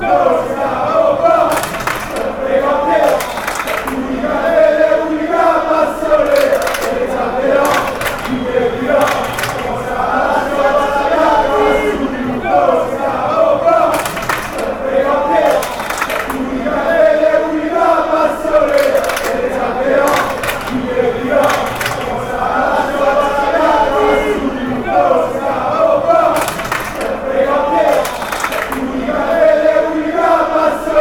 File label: passion fanchants